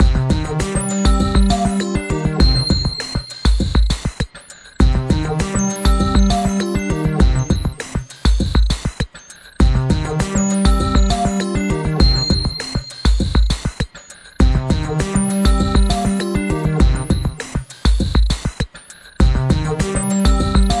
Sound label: loop